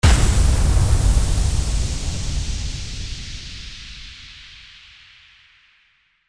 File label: IMPACT